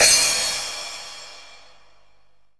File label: normal hit finish; osu; beatmaps